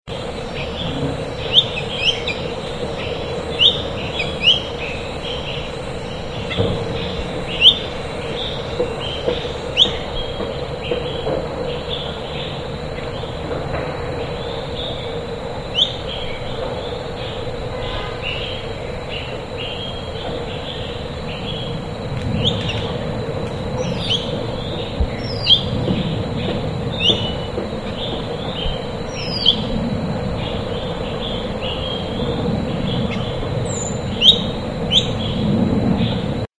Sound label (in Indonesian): suara panggilan cucak cungkok